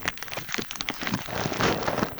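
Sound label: crush paper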